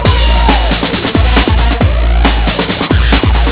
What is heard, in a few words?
music